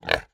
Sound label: animal pig kunekune grunt